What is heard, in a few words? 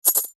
coin exchange withdraw